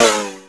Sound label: Pairs No Match; Finding Nemo; XBOX